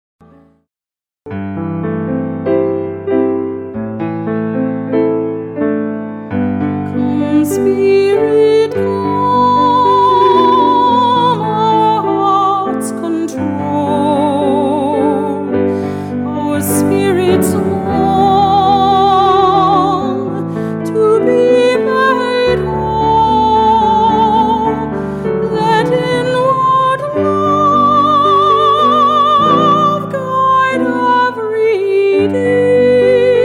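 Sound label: Vocalist and Pianist; Lyrica Demo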